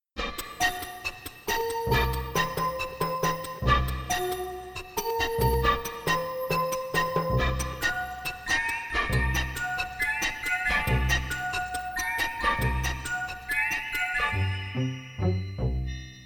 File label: Noisemakers; Grumpey's Noisemakers